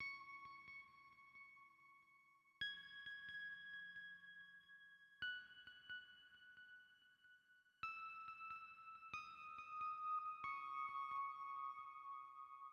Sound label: Piano Pluck